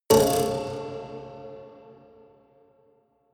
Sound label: haunted strings buzz